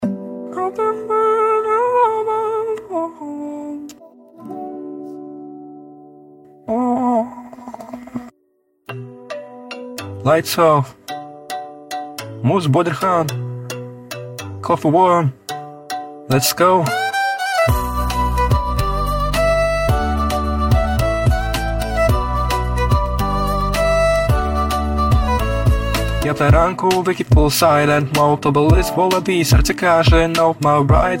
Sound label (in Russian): Сведение трека до после